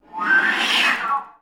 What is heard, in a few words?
FL FX, Advanced Orchestra